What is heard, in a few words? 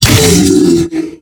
bear injured